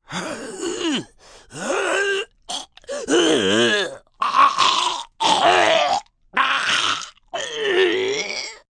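Choke Gasping male, Choking, male, gagging, gasping; Sound Effects:Voice; VoiceBox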